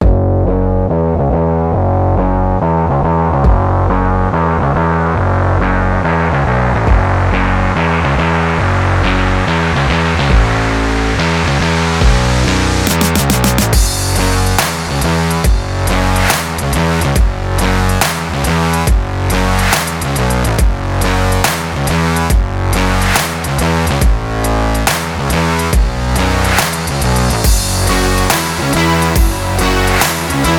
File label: energetic slow